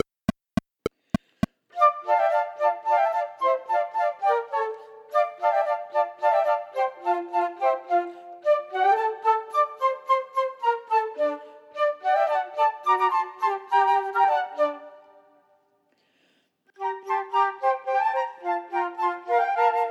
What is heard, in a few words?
Quartet minus 3rd part; Dvorak